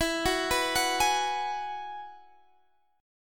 G sharp Minor 7th Sharp 5th E

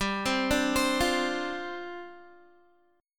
G 7th Suspended 4th G down Guitar

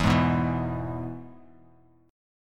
E-Major E; strummed; JGuitar

